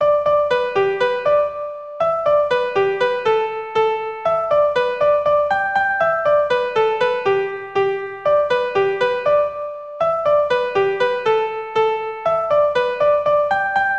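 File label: Polka, Traditional Irish Music; Maurice O Keeffes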